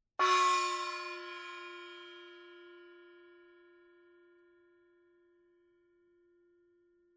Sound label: Ma Gong Med Loud; Ma Medium Loud Hit; Weiss 7" MA Gong; gongs